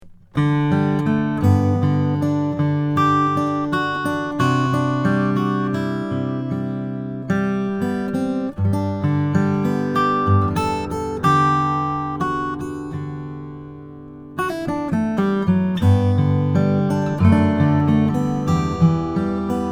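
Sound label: greenfield gf wenge, guitars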